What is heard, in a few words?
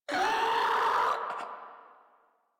Infested Crawler Hear Sound; Warframe